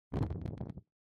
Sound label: Lighter Flame